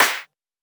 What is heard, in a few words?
Hiphop snare; Dry original